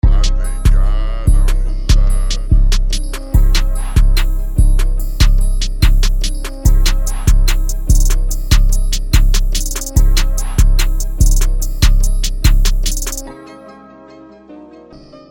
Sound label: Loopz, beats instrumentals